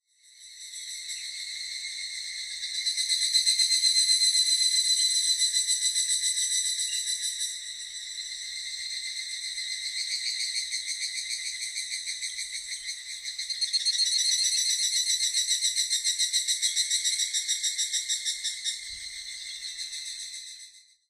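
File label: evening cicada; higurasi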